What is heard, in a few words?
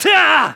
kiai; MonsterHunter; Fight